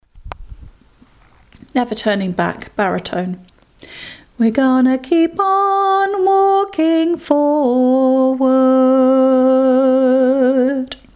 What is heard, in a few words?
baritone